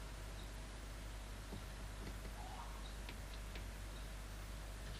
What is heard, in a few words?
morning window close; morning4min